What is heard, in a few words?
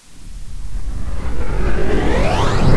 portal